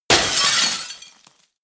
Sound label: smash, baugewerbe